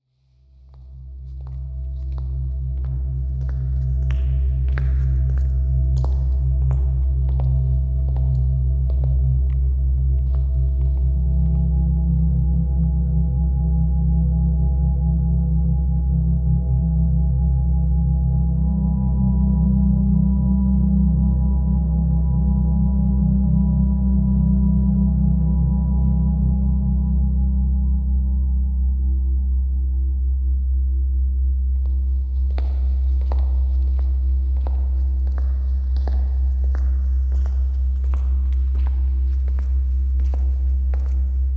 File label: St Julians Church Soundscape